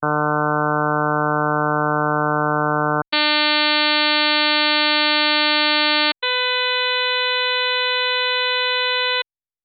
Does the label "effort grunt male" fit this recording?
No